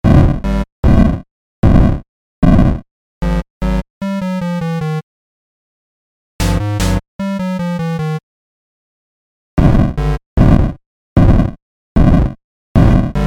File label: Beepbox